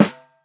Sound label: snare g sharp key, Royality free snare sample tuned to the G# note. Loudest frequency: 892Hz; snare drum